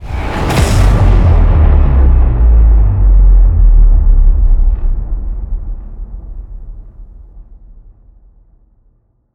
Stinger Modulate

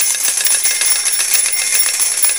Coin Fountain Loop; Skylanders Cloud Patrol; Arcade, iOS, Mobile

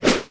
Jump; subway surfers city sound effects

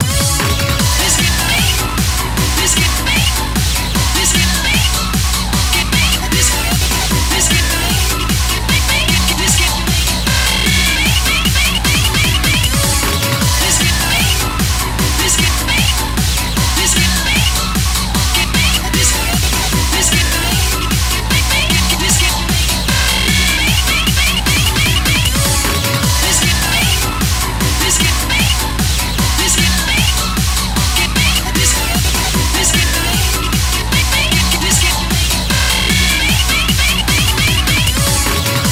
Acid Techno Music; beatmania IIDX 18 Resort Anthem

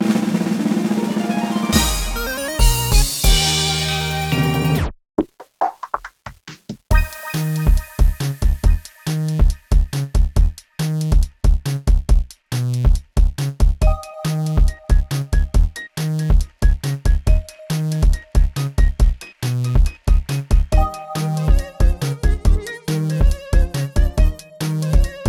Levelup; Born of Bread

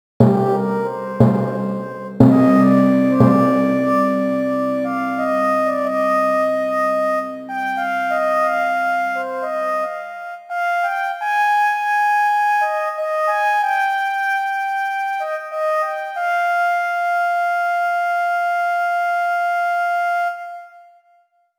Unknow Jingle; Unknow Jingle Type 4; Double Dragon II: Wander of the Dragons; XBLA; Xbox 360

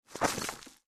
Statues Crumble; Google Snake

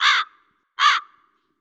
Crow

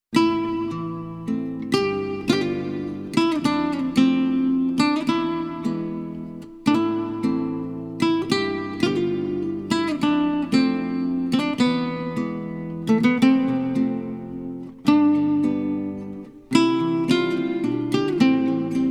Waltz, Gut Guitar Version; Rurouni Kenshin Meiji Kenkaku Romantan

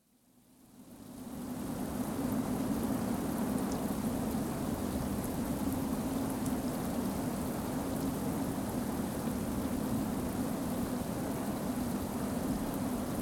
Boss Ambience Loop; Avie

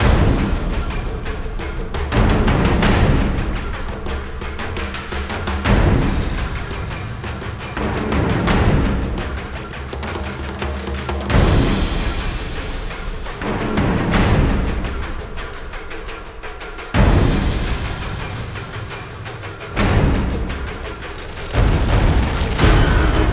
Base Loop low

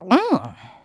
Eats; Podium

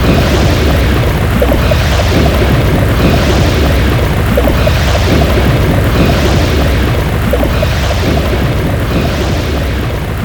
Enemy Shell wizard Active Loop

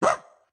Triple Swipe Attack; Caffiend Caelan; Going Under

